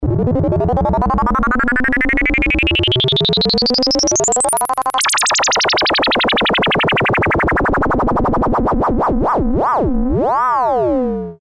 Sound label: sine w lfo